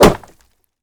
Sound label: Bullet Impact Wood